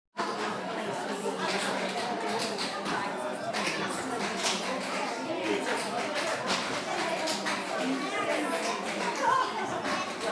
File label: the sounds outside tap class